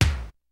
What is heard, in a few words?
kicks snare, kid; Kid Nepro; Elite; KICKS SNARES